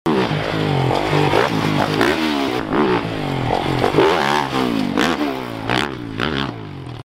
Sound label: Yamaha Motocross Bike; کراس یاماها